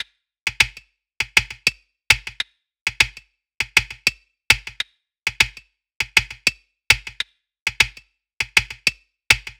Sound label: 100 Perc; Luxe; Ambient String Loops; Diffraction